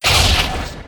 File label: destroy building; Stellaris